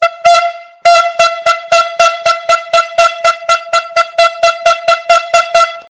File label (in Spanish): trompeta